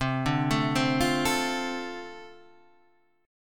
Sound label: F 6th C, chord